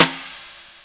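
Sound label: R8-SD, snare drum; X-Static Goldmine 1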